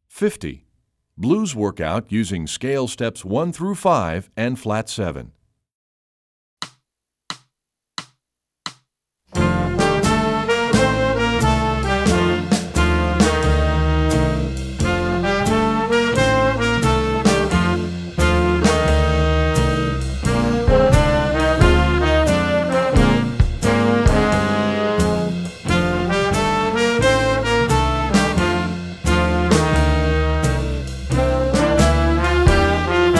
blues workout scale

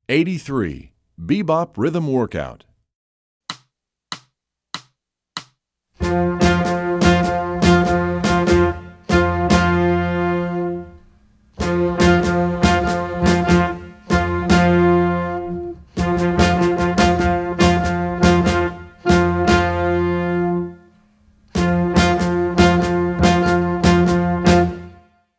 bebop rhythm workout

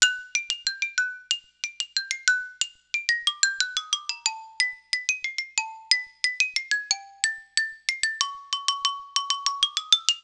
xylophone

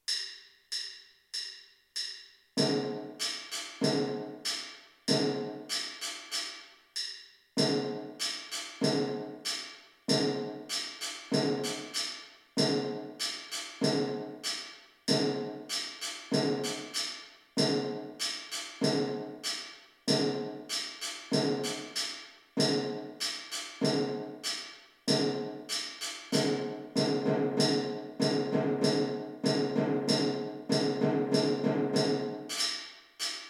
snare drum

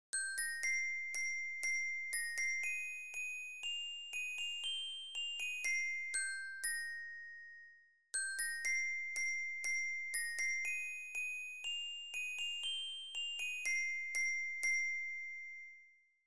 orchestra bells celtic air and dance

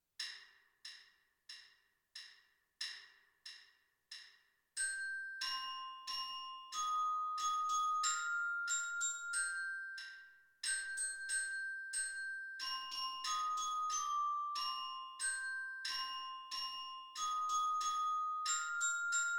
orchestra bells

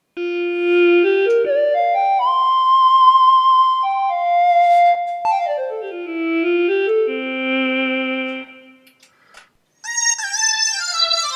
ewi sounds

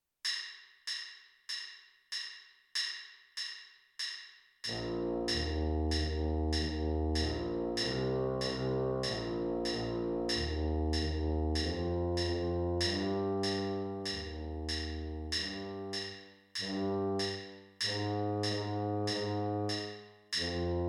tuba

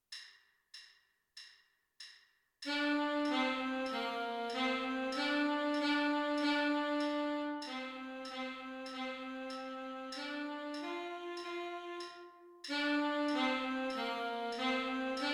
tenor sax

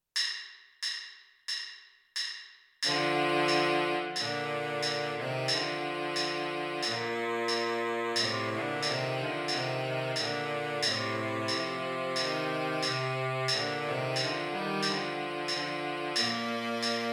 baritone sax; the conquering hero

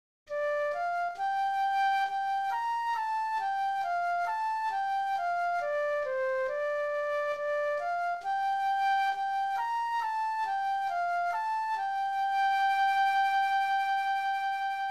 flute

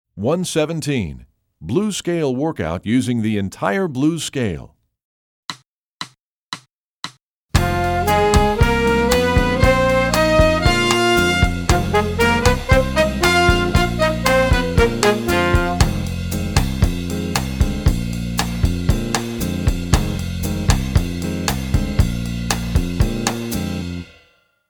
blues scale workout

